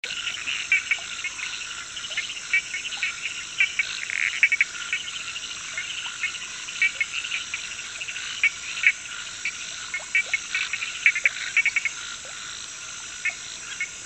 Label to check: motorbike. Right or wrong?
Wrong